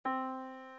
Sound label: yamaha Disk